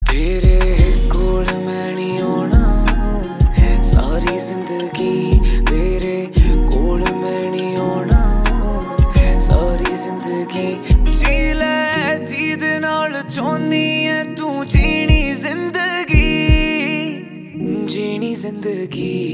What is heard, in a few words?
song ringtone